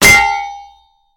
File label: Sword Clanks Heavy Si; swords